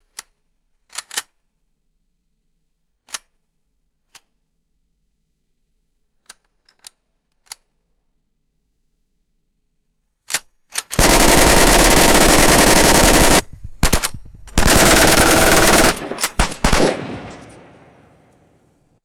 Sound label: machine gun cocking and firing short bursts; guns